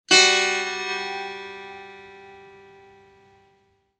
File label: setar sol b